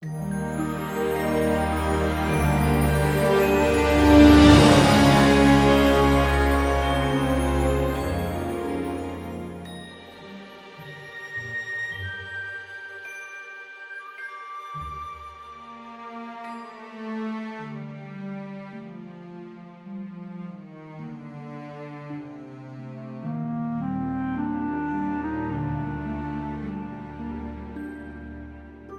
Closing; Epic Mickey; Wii

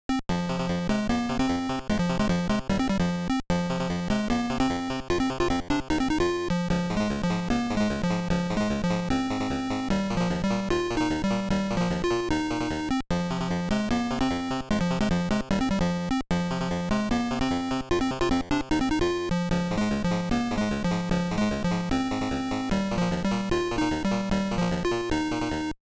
Game Over; Fatal Run; Atari 7800

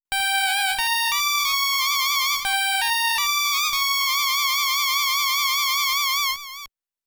Bat Flute; Ganbare Goemon Sound Treasure Box

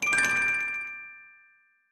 Goal

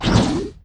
Enemy Wood Root Grow; gamerip

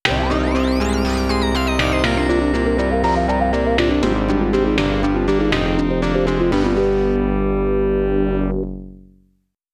GAME OVER FM Synth

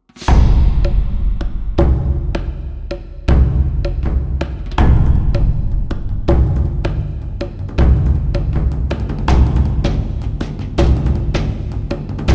combat opener

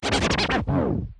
Fail Vinyl Scratch; Subway Surfers City; Android, iOS; Trials Run Fail Vinyl Scratch

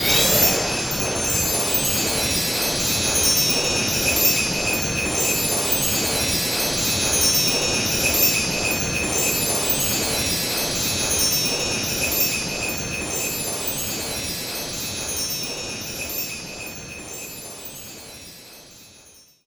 Plasma Xmas Loop

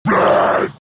Monster Yell

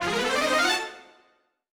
Encount

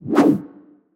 Swish

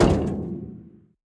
Weapon Metal Heavy

